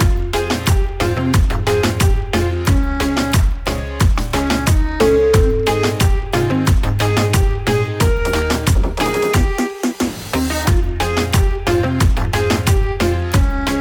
reggaetone

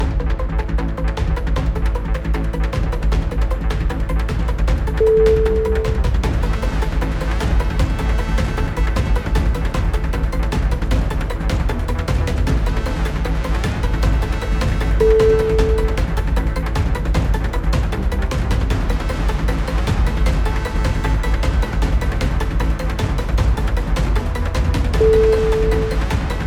hybrid percussive chase

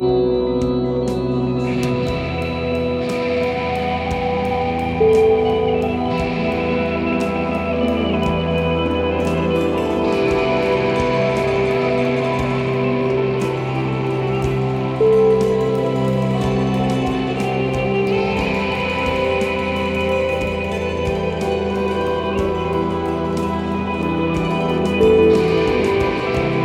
ancient spanish ruins

